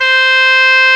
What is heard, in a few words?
FM OBOE; AKAI S-Series CD-ROM Sound Library VOL-8